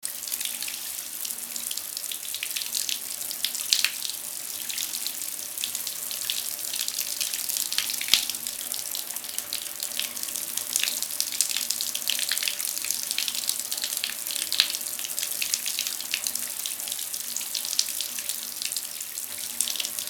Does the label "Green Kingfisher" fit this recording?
No